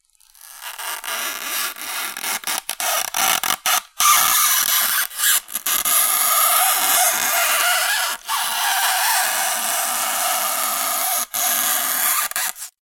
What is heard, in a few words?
stretch